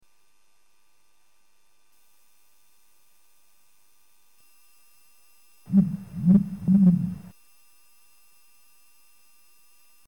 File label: ostrich; Strauß; Tierstimmen